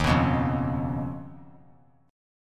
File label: Eb 7th Suspended 2nd Sharp 5th Eb; Eb7sus2#5 strummed; JGuitar